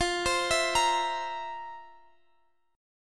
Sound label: F Major 7th Suspended 4th; arpeggiated